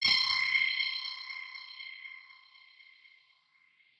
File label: ghost